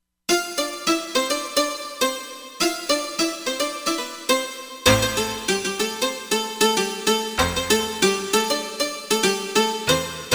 Ethno Atack; Korg MSC-13S Ethnic 2

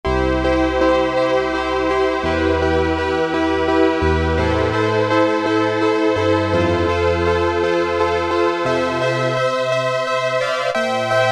SOFT PIANO, Oberheim OB-8; Factory Patches

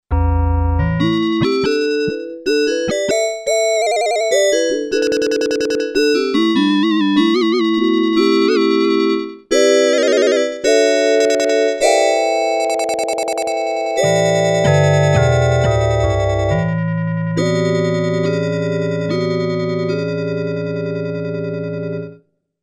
5TH SYNTH, Korg DW-8000; Factory Patches

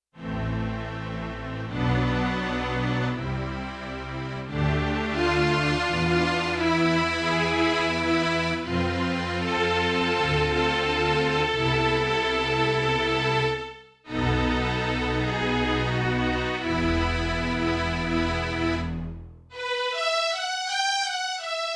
String Set, Yamaha TX16W; Orchestral Performance 1

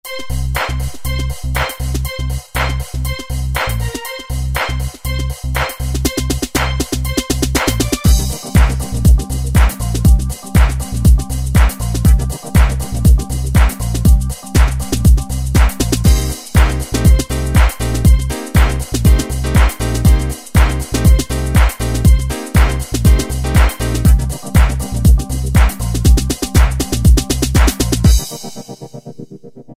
House, Yamaha QY70; Preset Styles

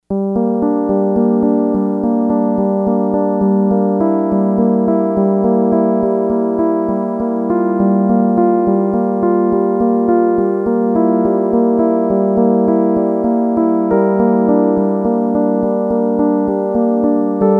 Acous Elec, Yamaha DX100; Factory Patches

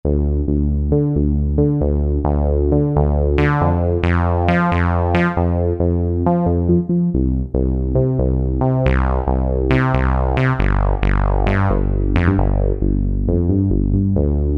Rubber Bass, Roland SC-50 Sound Canvas; Factory sounds

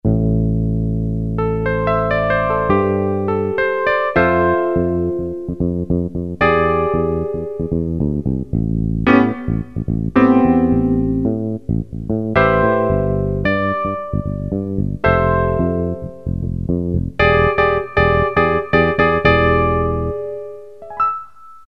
Piano

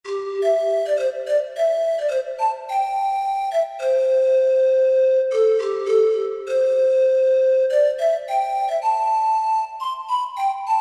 Pan Flute, Korg M1; Factory preset examples

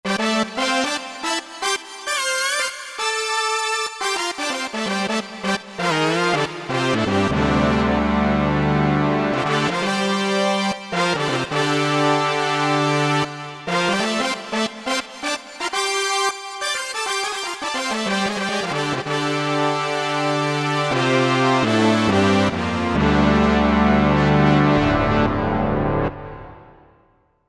Brass

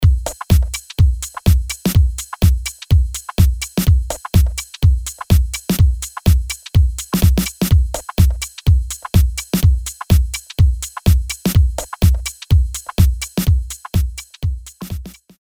Club Dance Beat; Apple GarageBand 2; Apple Loops

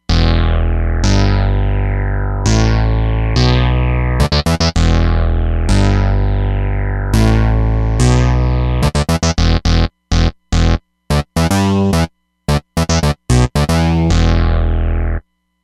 Super-G DX; Roland Fantom XR

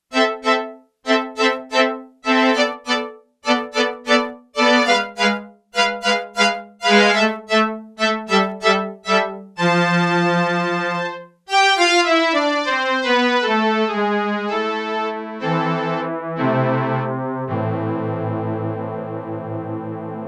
Violins, Yamaha TX802; Factory Patches; Voices

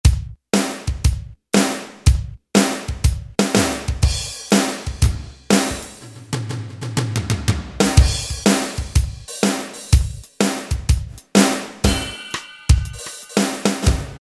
R&R Kit; Roland L-CDX-01 Rhythm Section Instruments

